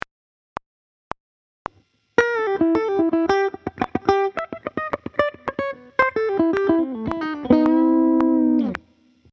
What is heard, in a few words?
Hybrid Picking